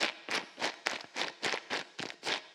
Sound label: Running On Wet Grass